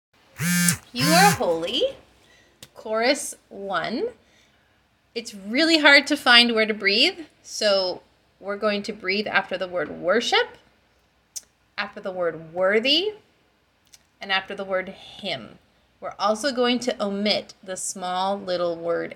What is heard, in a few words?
chorus